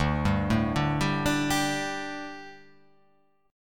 D Suspended 4th D, down

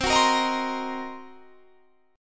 C Minor 7th Flat 5th, chord; Cm7b5; strummed

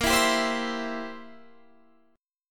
C 7th A sharp

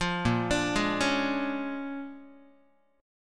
Bb 7th Sharp 9th Bb, Guitar; Bb7#9; arpeggiated; JGuitar

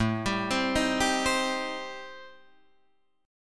A-Minor 7th Flat 5th, Guitar Drop D, chord

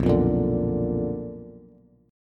B-Minor Major 7th Flat 5th B down Bass; BmM7b5 strummed; JGuitar

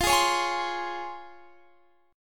C sharp Major 7th

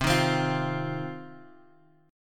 C Minor Double Flat 5th, chord; Cmbb5 Chord {X 3 1 X 1 1} strummed

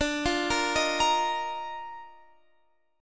D sharp Major 7th Suspended 2nd D

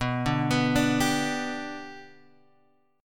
B Minor Sharp 5th, Guitar, Open G

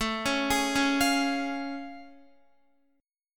A Augmented Major 7th A Guitar; arpeggiated

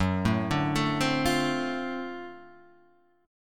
D 7th Sharp 9th Gb

